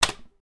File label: keyboard